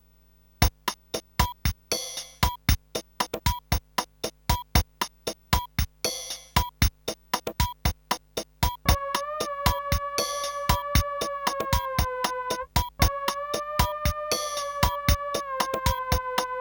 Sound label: Casio HT-3000; Musical Showcase